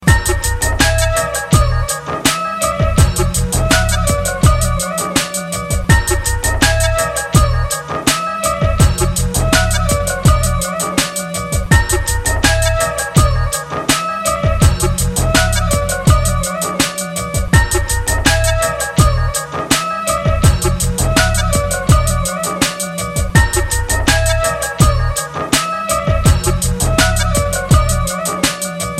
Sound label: Flute Melody